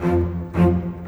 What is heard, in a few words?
Rock Pop Bass Cello, Orchestral